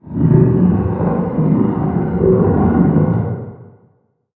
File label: Random Rock